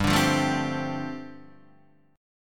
G 7th Suspended 2nd Suspended 4th, G, Guitar, Open D; down